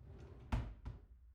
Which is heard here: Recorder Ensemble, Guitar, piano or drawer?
drawer